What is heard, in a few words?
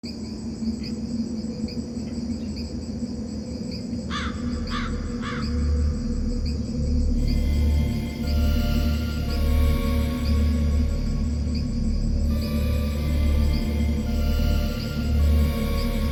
Halloween Ambiance; Halloween 2019; Onirism Unofficial OST